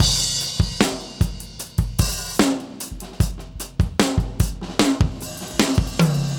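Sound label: 75 bpm, beat loops; Zero-G; Monster Beats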